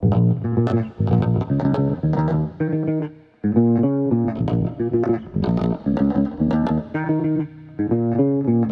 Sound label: 110 C Clanky Groove, Warped Bass; Loops; Touch Loops; CosmicFunk